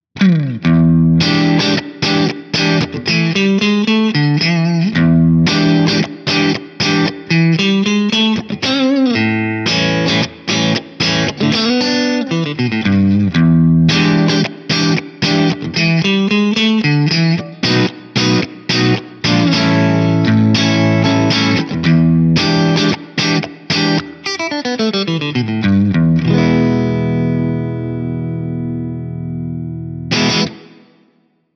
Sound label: breakup; jn12-100t-spec